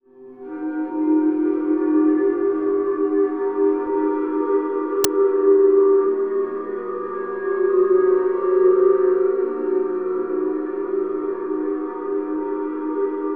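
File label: pan pipe, ambiences; Zero G; Malice In Wonderland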